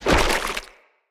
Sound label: Corrupted Slug Hit React; Creatures; Movement